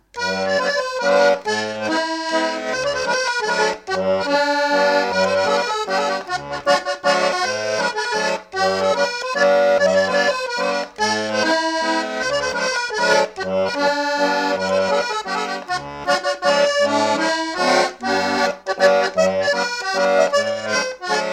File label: Mazurka